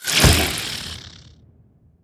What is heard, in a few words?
spitter death big, creatures